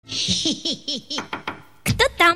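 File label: who's there sly laugh; kto-tam-hitryy-smeh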